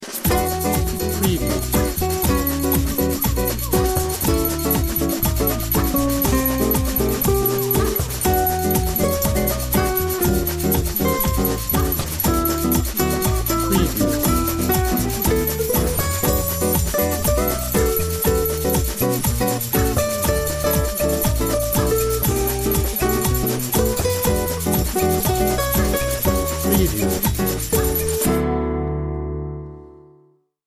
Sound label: Sweet Summer Samba